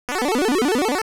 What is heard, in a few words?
arp 8bit